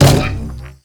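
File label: Launch Shroom Projectile Bounce; Warframe; Nokko; Launch Mushroom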